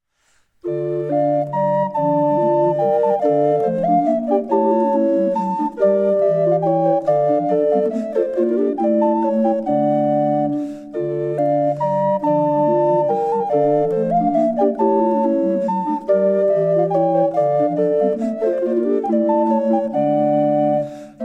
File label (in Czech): gemshorny s a t b sb